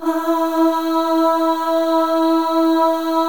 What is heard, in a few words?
Voices, FEM AHS; Spectrasonics; FEM.1 AHS